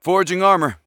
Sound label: Forging Armor; Warrior; Realm Royale